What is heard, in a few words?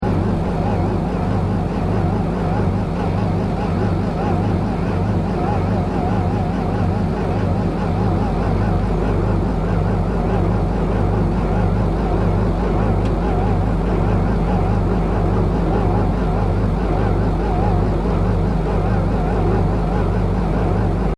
SCI FI VEHICLE HOVER LOOP; Various Sci Fi vehicle effects; Spacecrafts; SoundEffects-Foundation4